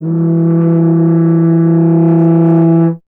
BRS TUBA, Solo Instruments

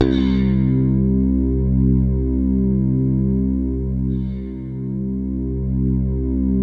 C2, SynthLine

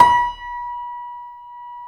C7 F, The Ultimate Piano Collection; East West

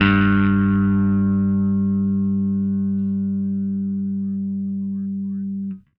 Happy Pub Bass Ending, Indie Rock; Sonic Foundry (Sony Creative Software); Indie Rock Anthems; Session 05 - Happy Pub

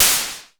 TECHNO PERCS; Crypton - DrumBase5000

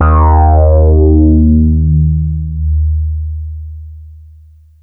FUNKY, BASSES

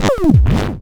Cwejman S1 Triplet Click To Kick

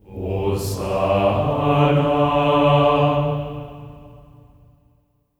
HOSANA G3; Spectrasonics; Symphony of Voices; GR HOSANA

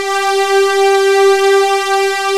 HYBRID G3, Strings

